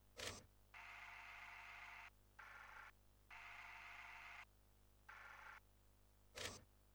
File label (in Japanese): この音が消えちゃうの 本来の音 アナログ出力